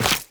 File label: Run Goo, Footsteps; Warframe; Tenno